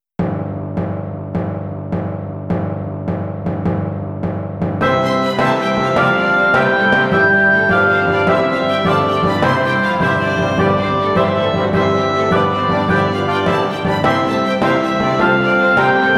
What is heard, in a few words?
Ode to Joy